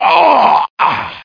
Death; Team Fortress Classic

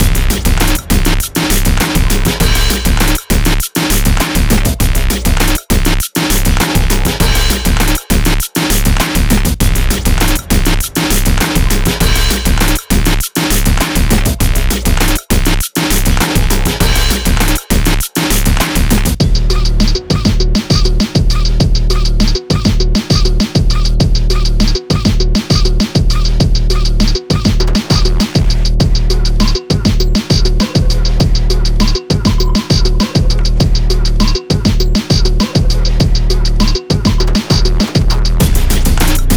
Game Over Loop; Bassya

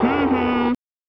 Shot by the Laser; Space Ace; SNES

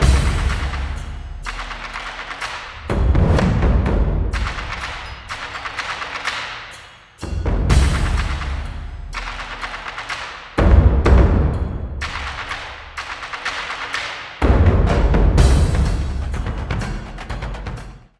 Combat Drums

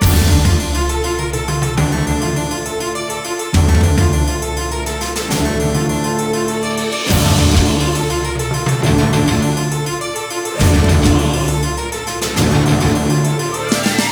Bar Fight; Orcs Must Die

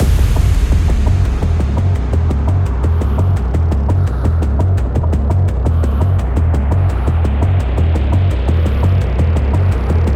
Boss Anticipation Loop; Death Wish; A Hat in Time

